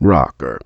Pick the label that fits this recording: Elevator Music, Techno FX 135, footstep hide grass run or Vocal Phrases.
Vocal Phrases